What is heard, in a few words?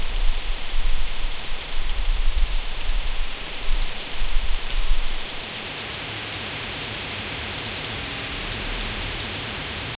rain, storm